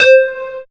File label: synth c, Top End Tinklers; Dance Synths